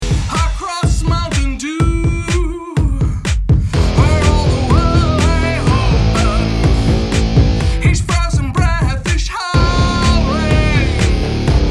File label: Ringtone